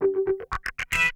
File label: GTR; ZERO-G; Datafile